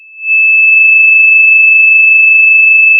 Sound of a tea kettle whistling

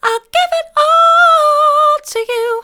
i'll give it all to you soft, vocal

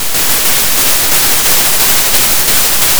Sound of a thunderstorm

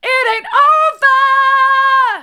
it an't over hard, vocal

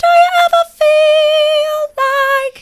do you ever feel like soft, vocal

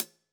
KHats Clsd

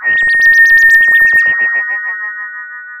Sound of a police car siren

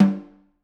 Snr Off, drum

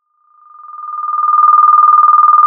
Sound of a phone ringing

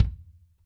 Acou Kick, drum

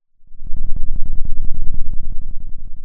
pop, Sound of a thunderstorm

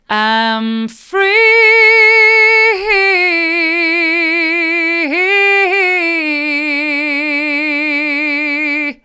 hard, vocal

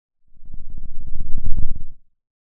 Sound of a lion’s roar

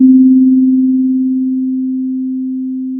pop, Sound of a church bell

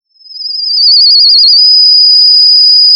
pop, sound of a tea kettle whistling

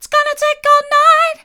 its gonna take all night mid, vocal

